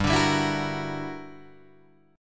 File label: G Minor Major 13th, G, Guitar, DADGAD; GmM13; strummed